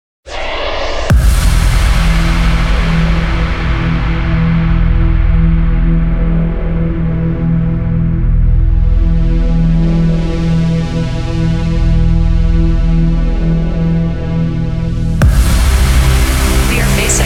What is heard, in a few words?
intro, future trance; Future Trance 93